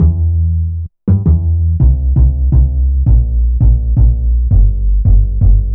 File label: Fat Upright, Bass Lines 166.5; Zero-G Jungle Warfare 1, 2 & 3; 90 s Sample CDs